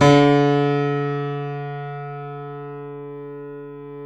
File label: C7 F, The Ultimate Piano Collection; East West